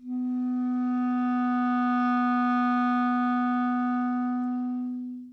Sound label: C sharp 4, clarinet